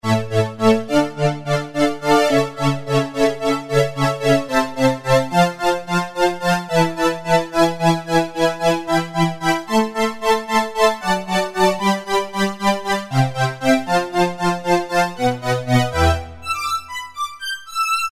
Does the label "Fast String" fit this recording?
Yes